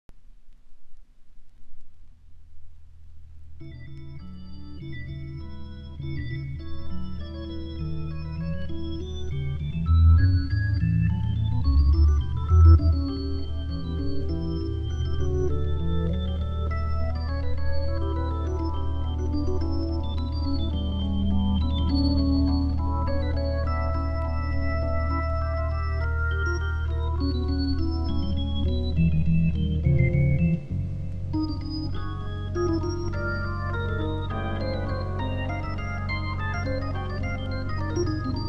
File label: Bach Style Piece for Organ & Electric Piano